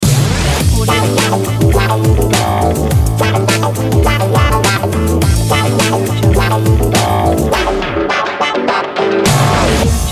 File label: cool funky action logo